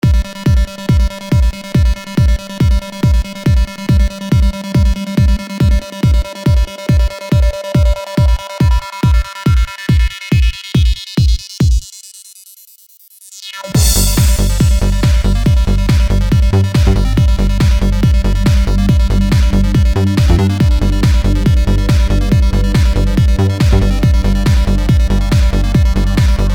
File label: Winds of Trance